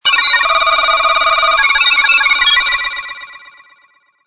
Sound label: Very Annoying Ring